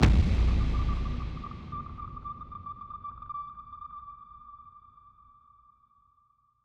Deep Cinematic Impact

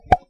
Sound Bottle

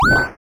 Jump

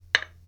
Glass Put Down

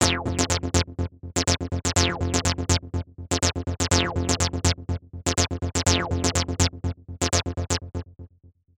120 acid Arp

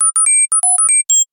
8bit Beeps

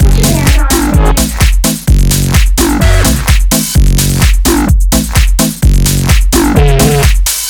128 House Glitch

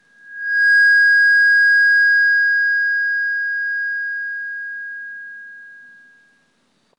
Mic Feedback Short Low

